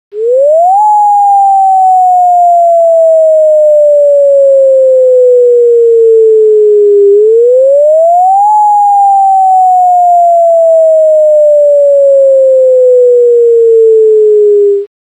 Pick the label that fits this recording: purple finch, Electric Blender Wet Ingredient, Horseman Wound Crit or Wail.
Wail